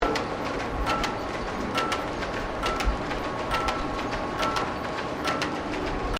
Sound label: Wheelwright Belt Drive Machinery Power Belt Operating Loop, Colonial era machinery